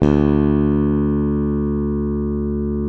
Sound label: SPEC SUSTAIN, Bass